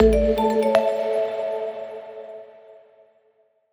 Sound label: Menu FX Organic - A6(Sus2), Video Game; Cymatics; Video Game Sample Pack Vol 1